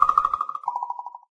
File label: avatar message; uisounds; There; ThereClient